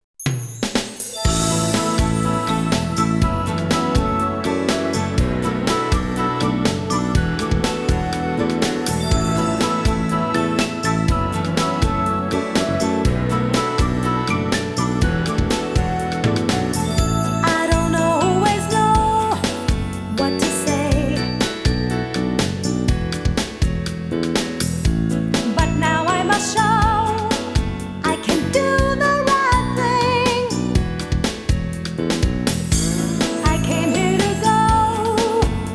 A Victory Song; The Dynastic Hero pc engine cd

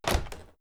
Impact Wood Medium

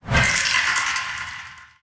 Blue Mage Attack Cast; portal quest android

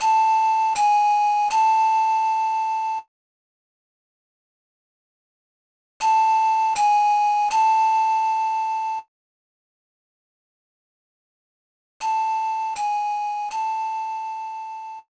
Spirit Flute Practice; the legend of zelda spirit tracks; Birds

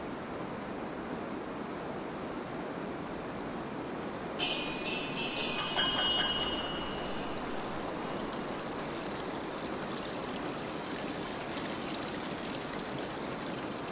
dragon ambient

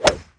Swing Drive, golf; Pangya; Fantasy Golf

